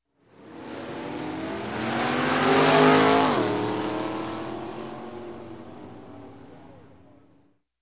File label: Stock Car Drag Race; Sound Ideas; Series 2000